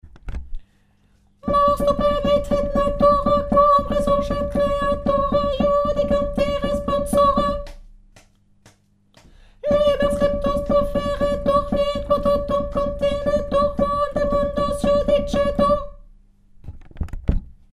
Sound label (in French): dies irae lettre F haut